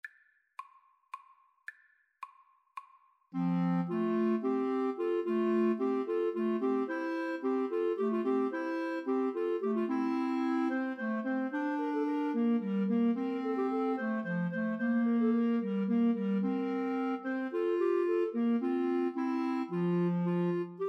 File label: Clarinet Trio